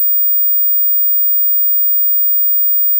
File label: 15khz